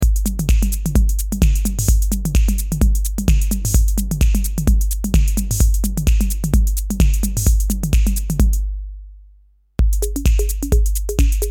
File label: conga snap